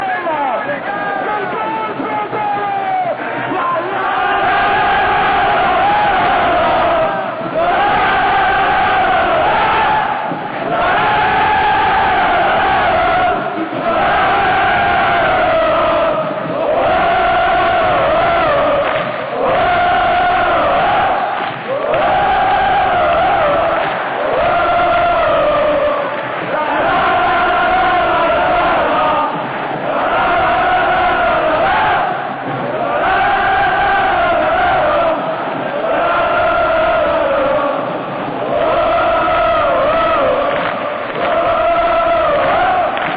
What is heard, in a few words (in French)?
chants; Cavese